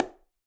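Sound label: Bongo Low Rim; Latin Percussion; Drums; Drum Hits; Ableton; Factory Packs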